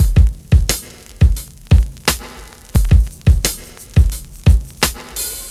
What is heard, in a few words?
And Wah Wah; Zero-G; Beneath The Planet of The Breaks